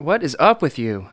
bumping; Messiah

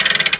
blip; outlaws

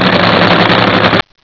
Restore down; VoitureCourse

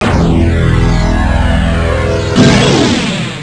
Morph; Bugs Bannis; Squish 2.6.8

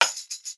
dance; Immortal

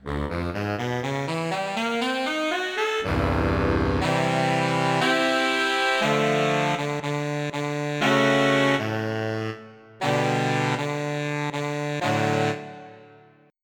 Hard&Growl, altosaxs; SoundFont - Traditional, Voice & Keys - Volume 2

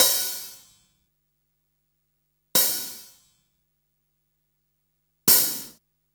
Open Hats; Hats shakes bells; The Loops

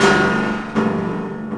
Piano perc; 22,050 sample rate; SpecOps2MPlayerDemo; Music

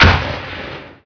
m203 explosion; SpecOps2 MPlayer Demo

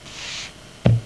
Slide Card under Deck; iPoker 2000

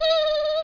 HIHIHIHI; MOPSY

